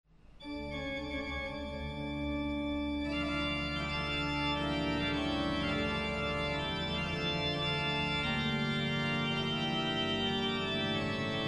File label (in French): IMPRO plein jeu